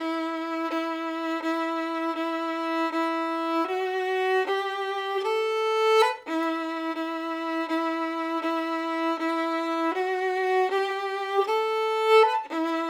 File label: violin